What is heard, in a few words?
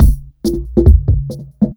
FILTER, Big Beat Drumloops